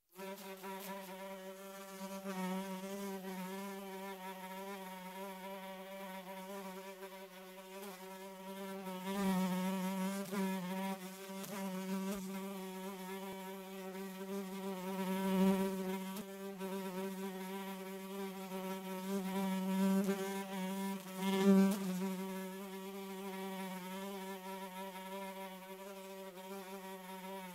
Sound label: fly; disease